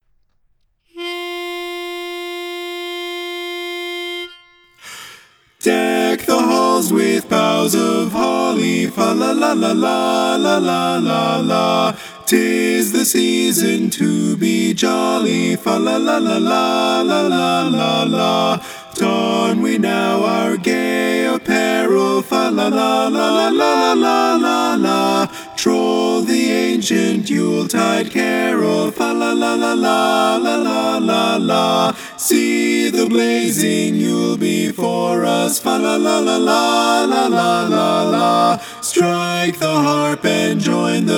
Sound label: Deck the Halls bass missing